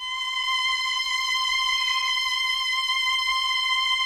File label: C#5, CHAMB STR; Sonic Reality; Vintage Timetraveler